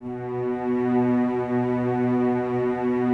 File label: Cellos B 2, String Ensembles; Miroslav Vitous; LINKS ORCH